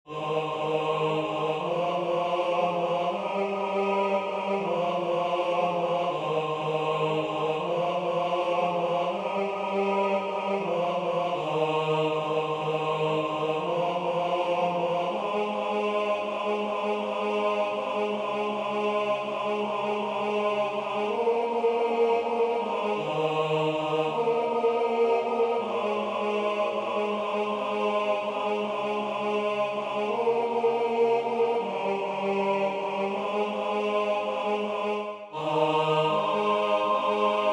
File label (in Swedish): TENOR; Nu tändas tusen juleljus